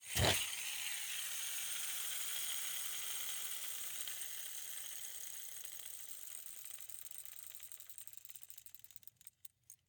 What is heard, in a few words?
Rollerskates, old fashioned, all metal, wooden wheels, swipe, spinning, slow to stop, thin; Eiravaein Works; Vaeyan IV